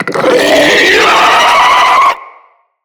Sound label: Infested Puss Ancient Attack